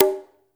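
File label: brush conga, right; Spectrasonics; Liquid Grooves 1 & 2; CONGAS+BGOS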